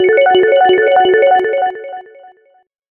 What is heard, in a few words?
Retro Echo Tone